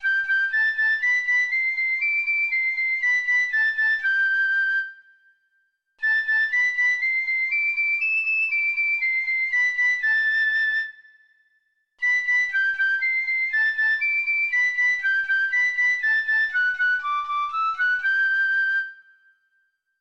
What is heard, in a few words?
staccato